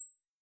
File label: Horror Inspect Sound Ui Or In Game Notification